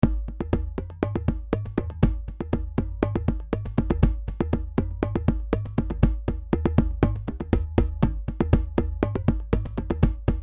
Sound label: Remo Djembe